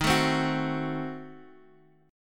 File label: Eb Diminished 7th, Guitar, Half Step Down